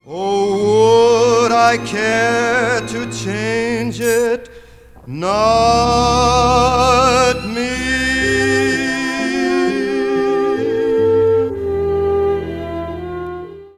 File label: Glitch; MFSL